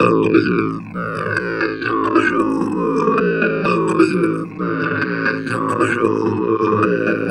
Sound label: DIDJER TALK; Distored Reality